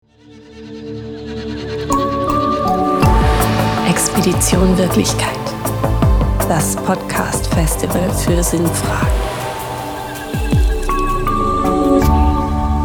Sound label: Jingle; RefLab; Expedition Wirklichkeit